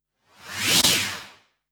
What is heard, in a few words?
Simple, transition